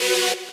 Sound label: saw